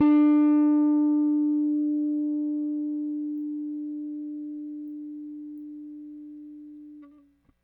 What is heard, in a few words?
RickThumb-B, RickenbackerBass; Airwindows